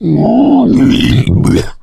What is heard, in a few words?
male boomer lurk; voice; idle; Left 4 Dead 2